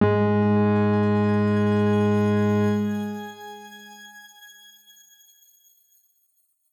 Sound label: Grain G#2 mf; healing soundscapes; HSS Ableton Pack1; X Grain